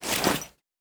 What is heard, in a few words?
Bag; Survival Game Sounds - Lite Edition; Objects and Items